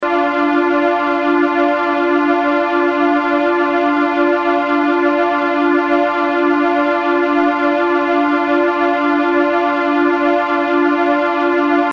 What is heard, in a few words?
loop